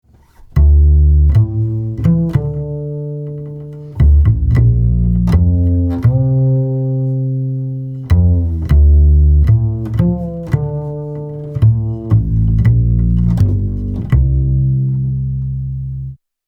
Chandler TG 1 Double Bass Lim gr 6db

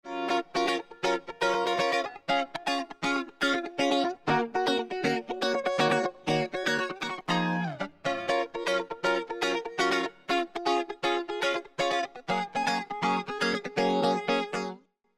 Guitar Stereo Mode; SRE330

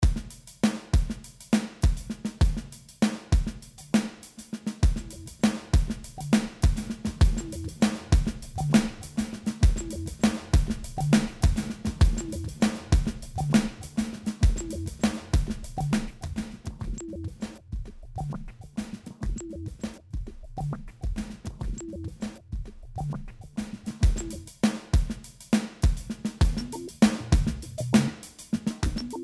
Drum; MW Presets

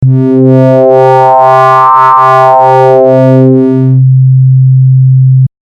Mod Int phs FM

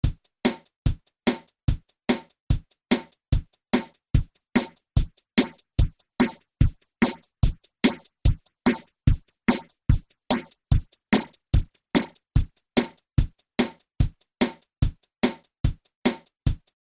fx phaser

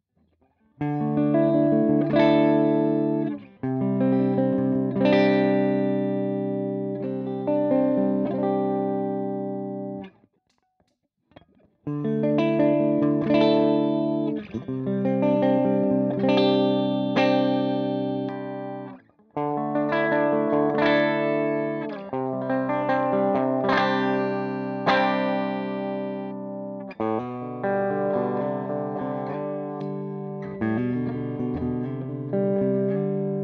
Clean

